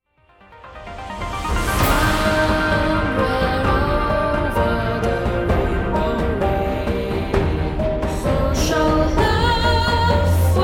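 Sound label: background music crossfade; Invaxion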